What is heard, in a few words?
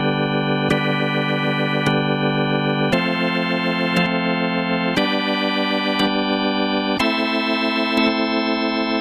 chord chorus